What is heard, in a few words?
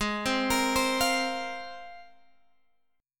Ab 6th Add 9th Ab, Guitar, Open G